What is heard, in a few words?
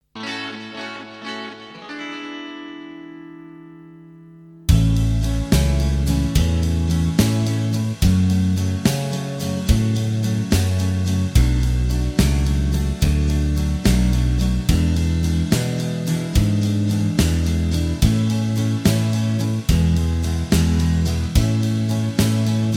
(A To Bb) No Lead Guitar